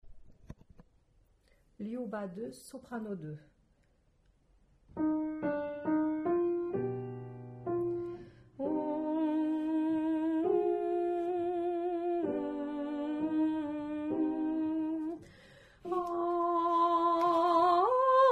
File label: Soprano